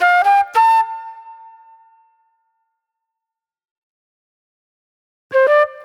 Classic Soul Flute; Sonic Foundry (Sony Creative Software); Manhattan Flutes; Soul 1

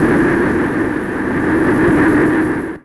noise, production effects

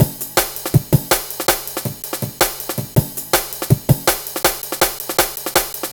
Drum Loops, Jungle, 162; Scotty

